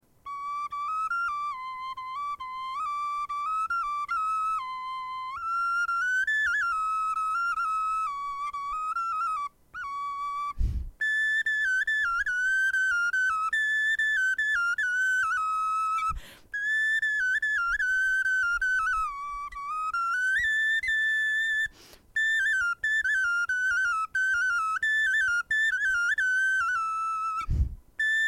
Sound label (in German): melodie